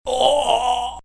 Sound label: Die Low Damage